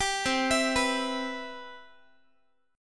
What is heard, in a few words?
C Major 7th Guitar; CM7; arpeggiated; 22,20,19,21,x,x; 4A,4E,5A,5D,5G#,6C#